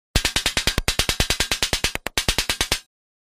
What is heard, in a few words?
9mm Uzi Bullets Impac, Bullet Impact Into Metal, Hollow Sounding